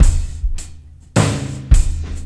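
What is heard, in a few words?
drum, breakbeat 106; Best Service ProSamples vol.24; AKAI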